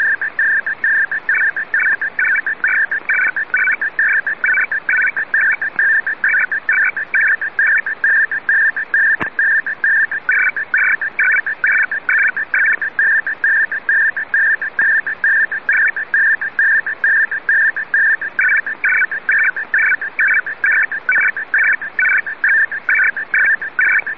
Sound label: TWINPLEX Asymmetrical 100 Baud; greek